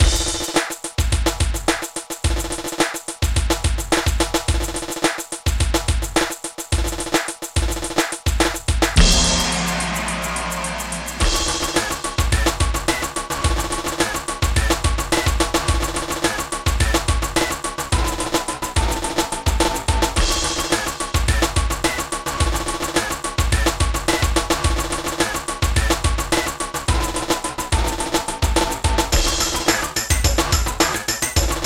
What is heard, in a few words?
Drum Intro